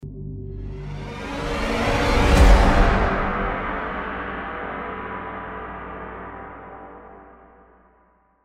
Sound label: Music Desert smoke end hero